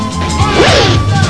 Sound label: zip